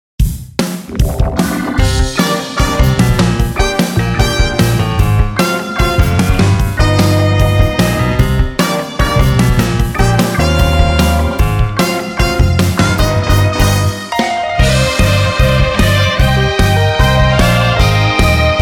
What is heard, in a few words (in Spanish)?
Gritos de Guerra